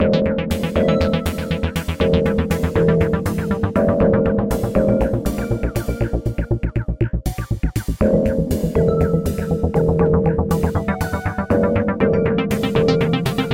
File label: sci fi suspicion 120bpm